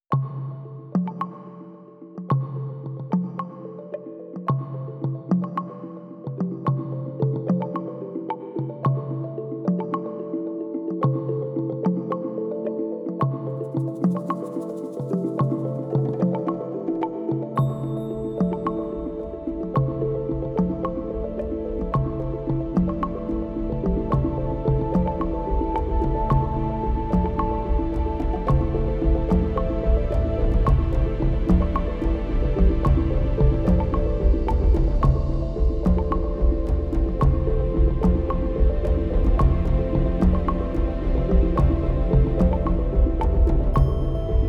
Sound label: 110 BPM